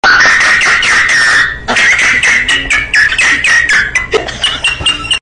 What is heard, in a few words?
laughing dog